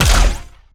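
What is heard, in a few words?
Laser gun Flanger Fire Heavy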